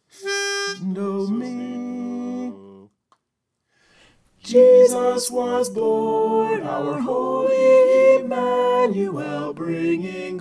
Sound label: soprano